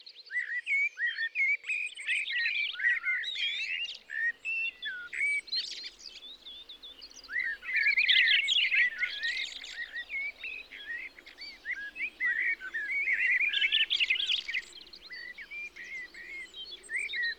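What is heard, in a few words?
purple finch; purpurnii zyablik; zvuki prirodi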